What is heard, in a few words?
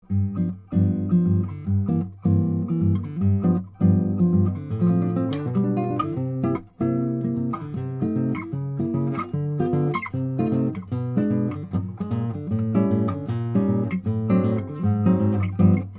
Jazz; Nunez Lucio7